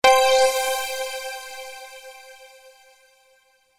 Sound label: Power Up